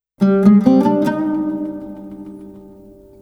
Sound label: oud